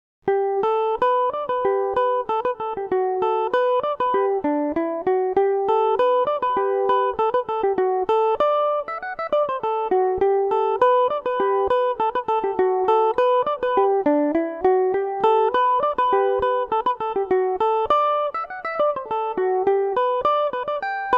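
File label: G major revelation, irish mandolin; The Humours Of Shangri-La; Revelation Jaguar electric mandolin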